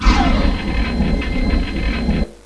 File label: beam; RPG Maker; Legend of Heroen v1.20